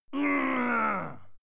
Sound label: male fight pain; schreie; menschen; soundpool